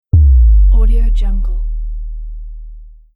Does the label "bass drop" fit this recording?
Yes